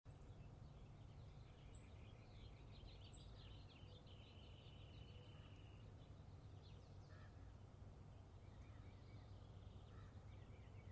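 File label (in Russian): с вороной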